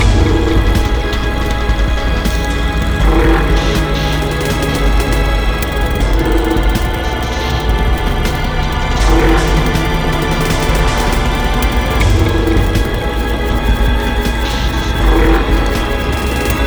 Steelworks Medium; rive wreck hack die retry; ps4

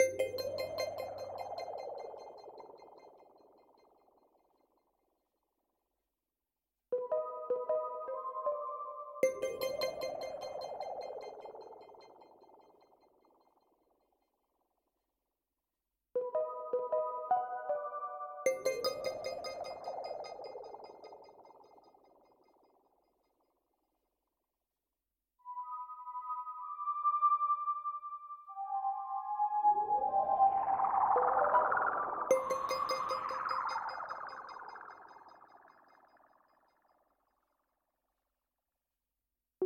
Fight Melody; We Happy Few

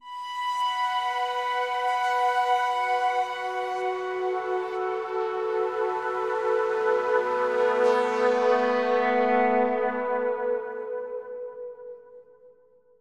Synth B Long; Torchlight III; PS4

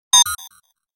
Menu Abort; Prison Architect

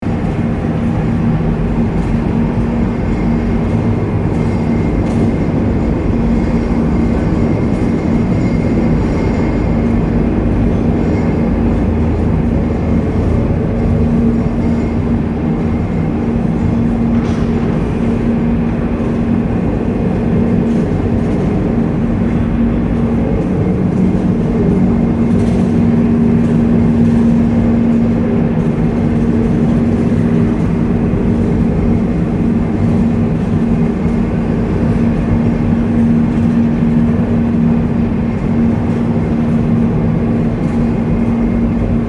Amb Descend Mineshaft

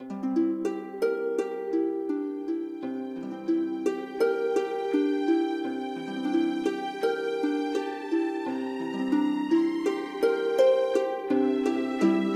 Harp Orch

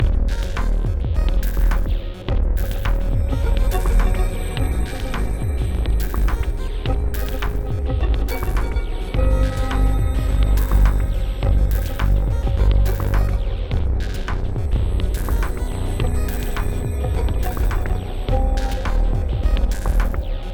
Menuloop; rive wreck hack die retry; ps4

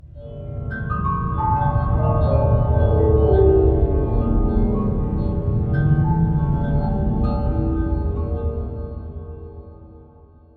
Amb bells

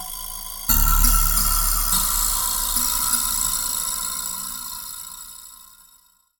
Lo Vibraslap

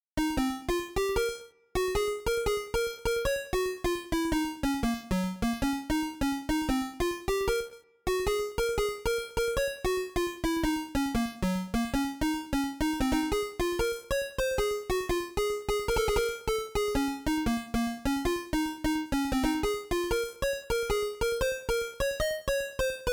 Melody; chippy

